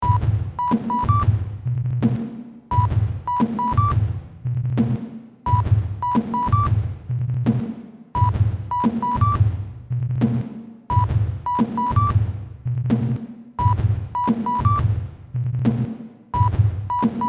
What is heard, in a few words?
Sinewave